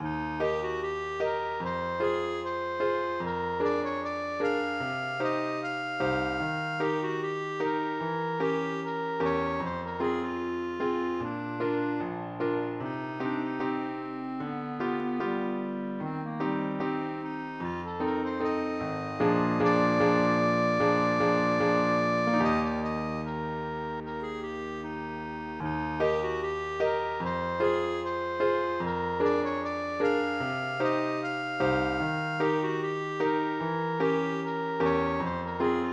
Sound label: Etude in Eb Major